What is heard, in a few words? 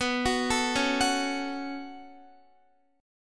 B 7th Suspended 2nd, Guitar; B7sus2; arpeggiated